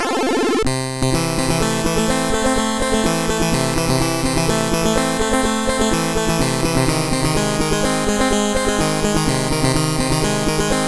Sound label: Piano Pling, MOS6581R4; HVSC